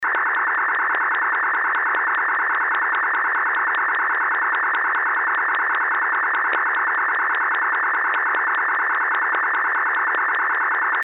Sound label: round noise